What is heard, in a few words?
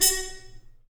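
brass trumpet mute stab, Harmon stabs; Harmon Amb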